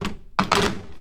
Pay Phone Pick Up Hang Up; Home & Office; 1001 Sound Effects

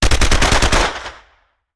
Machine Gun; Sound Ideas; Guns

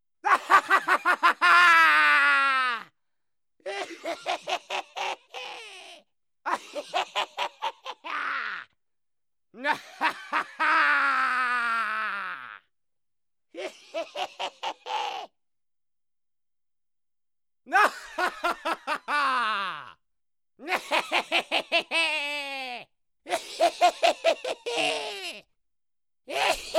Laughing, Scary Sounds; 1001 Sound Effects; Halloween Horror Sounds Effects

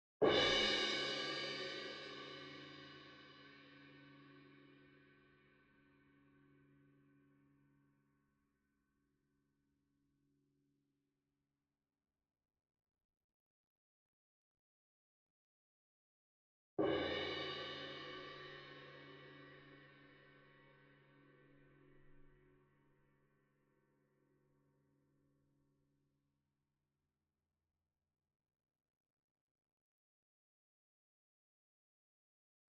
CYMBAL Crash Hit Soft Beater Medium; boom library; Cinematic Strikes Construction Kit

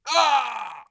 voice damage male; Blind Gladiator

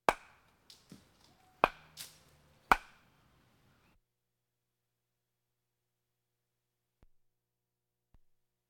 Crowds Race cars Baseball

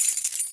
Pickup Key; SFX Kit; Pickups

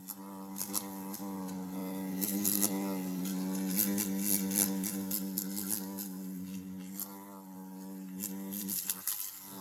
fly loop; Blind Drive

